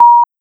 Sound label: Sound FX Bleep 2